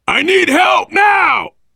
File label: dying, survivor voice, biker